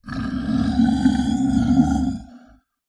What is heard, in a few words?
Animal Mammal Carnivore Tiger Snarl; animals; AdobeCC